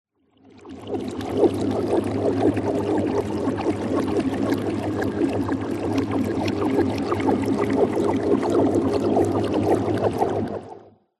sound design weird bubbles reversed reverb delay

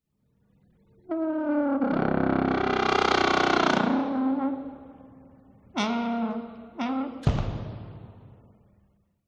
Door Haunted House; audio hero

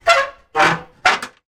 industrial metal ladder scrapes

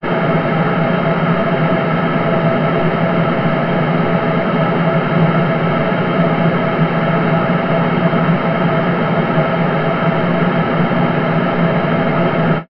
industrial large gas leak

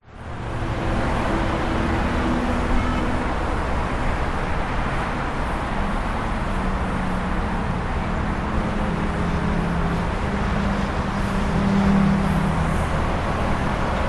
USA Interstate 15 in Las Vegas

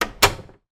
household door wooden external shut; sound effects one

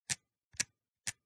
Camera Film 35mm SLR Shutter Speed Dial; CANON A1; MKH8040; PMSFX

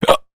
human hiccup male; sound effects one

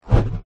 buzz whoosh fat whoosh quick x2 transition; skyclad sound

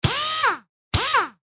Pneumatic Tool Drill Not Muffled Long Bursts; noise creations

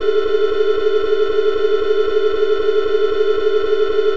science fiction alarm

